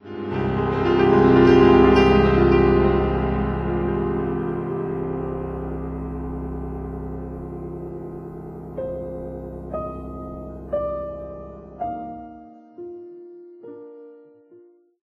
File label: Piano Collections; Ending Theme; Final Fantasy X